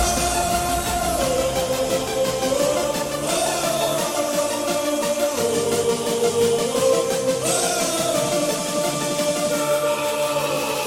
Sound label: turbo